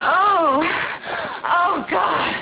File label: Orgasm